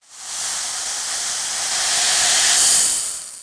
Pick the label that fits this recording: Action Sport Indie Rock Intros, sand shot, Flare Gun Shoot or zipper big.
sand shot